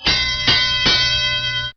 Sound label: bell